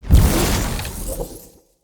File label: Corrupted Battle Dog Shield Start; Combat